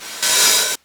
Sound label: Jomox Hat